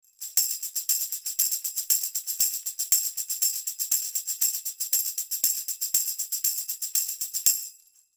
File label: tambourin close; Lewitt 240 Pro